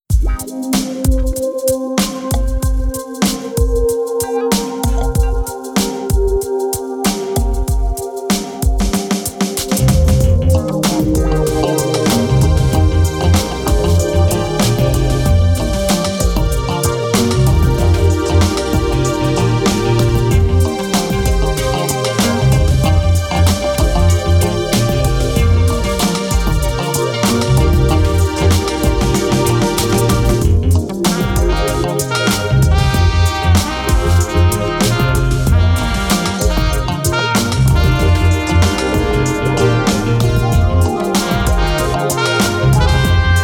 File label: Soulful Atmosphere